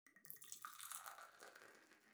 beat beer pouring; netent

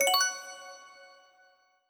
win countup end

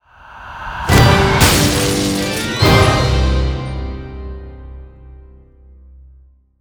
chandelier Fall; netent

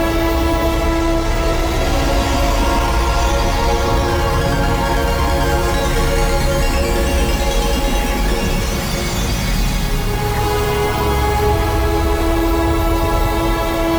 super Mega Win Loop; netent

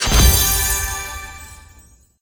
butter Land; netent

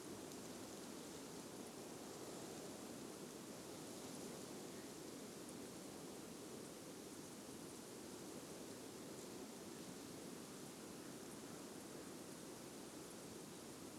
reel Spin; netent

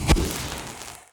betline Start Explosion; netent

